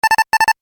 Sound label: Bleeps Loop